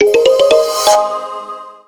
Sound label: Jingles item; otome the exorcist